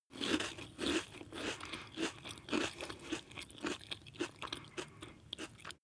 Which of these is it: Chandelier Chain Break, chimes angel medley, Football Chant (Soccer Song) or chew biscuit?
chew biscuit